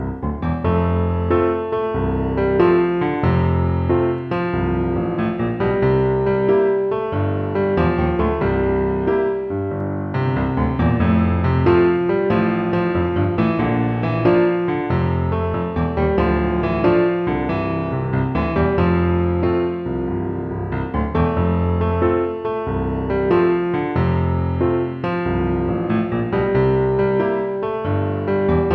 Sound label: Gospel & Stride Exercise - Crossing the Left Hand over the Right